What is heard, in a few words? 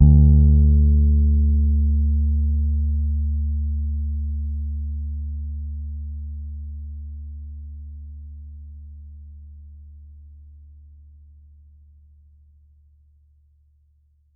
D3 soft, Pedulla Thunderbass, Bass; Giga Samples Collection